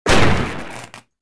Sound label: death; Star Wars Jedi Knight II Jedi Outcast; XBOX